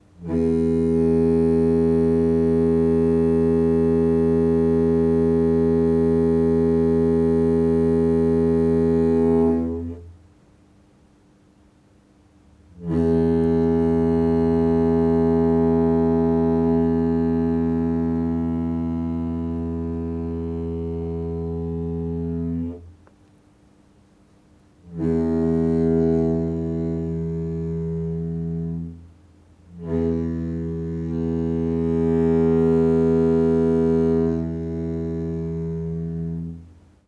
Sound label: Air In Pipes Horn